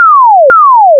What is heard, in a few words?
elt horn, Cockpit; A320